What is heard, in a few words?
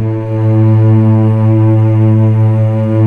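cellos A2, String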